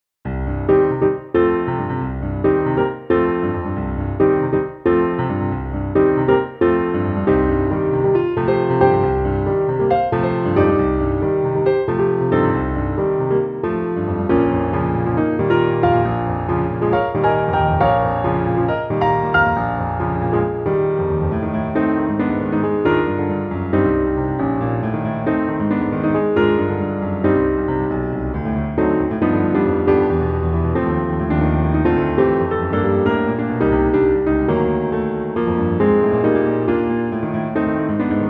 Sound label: piano; Pilot Wings